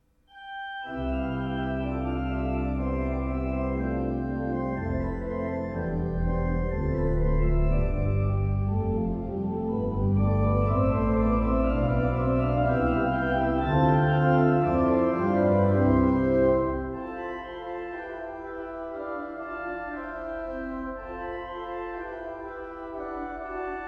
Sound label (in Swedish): Preludium; ps 384 livets vatten